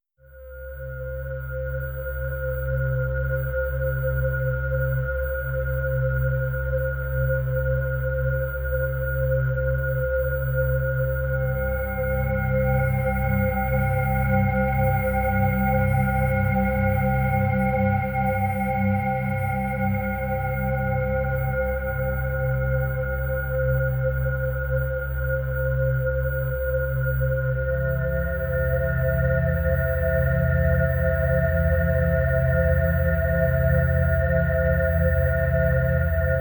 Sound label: Sea of Waves